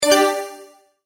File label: multimedia tone start